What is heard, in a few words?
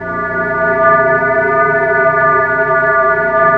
A#3 TUBE P; Northstar; The Wizard VOL-1; SYNCLATRON